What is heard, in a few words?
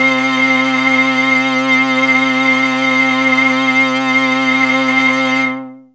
VS CRAZY C, SYNTH; AMG; Global Trance Mission VOL-2